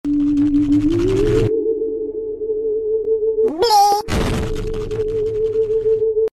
Spooky Blegh Cat

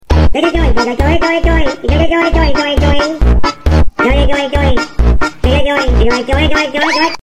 Doi doi doi PHONK version

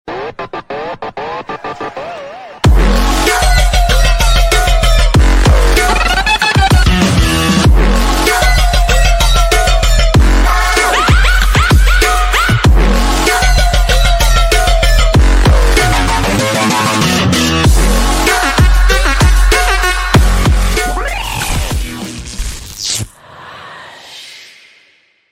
Groove Battle; Minecraft

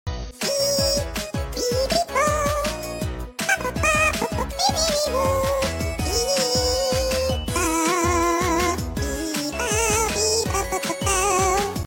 Hedgehog Singer

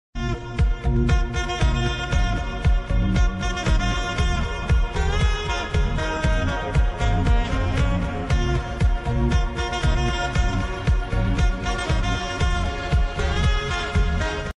Saxophone; Fortnite; Lisa Simpson

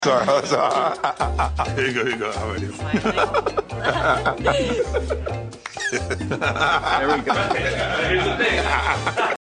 giggling; 50 Cent

